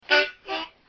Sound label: blues harmonica lofi, harmonica riffs